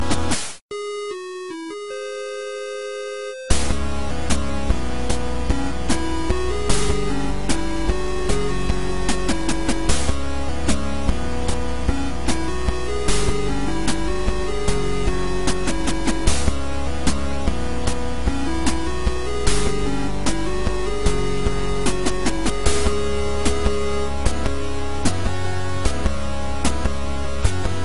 Theme 8 Bit; Batista

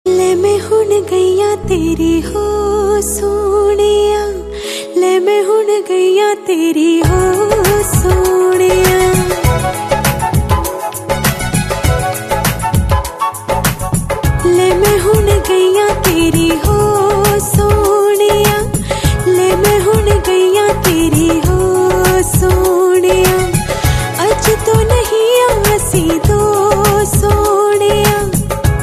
Loving Song

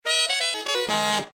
Duck Sax; SCP

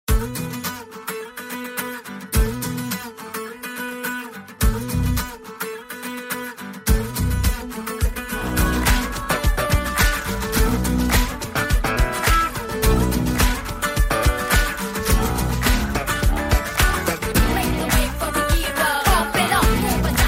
Guitar

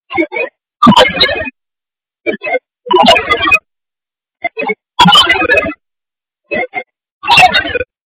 Ringtone Loop

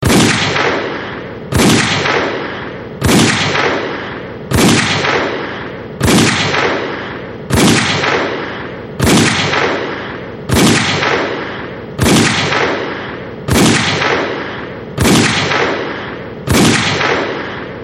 12 Gunshots Sms

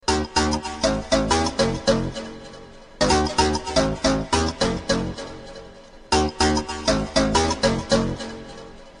Industrial Groove

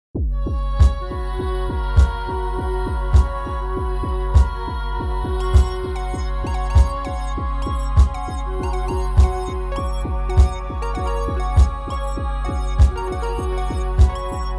Electronic Dream

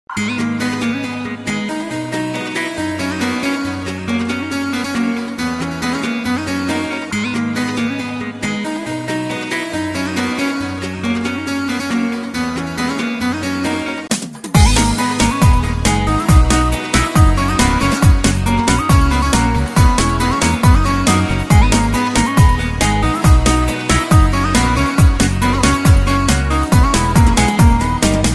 Romanian Guitar

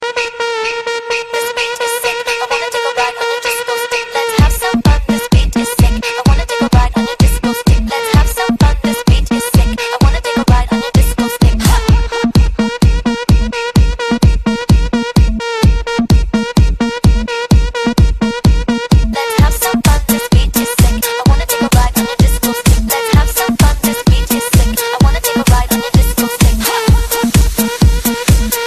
Disco

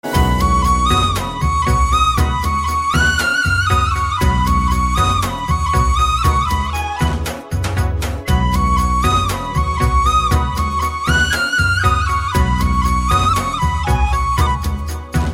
Superb New Flute

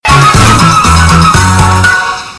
Lvl Win; Angry Birds

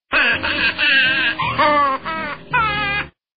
laughing; Snoopy

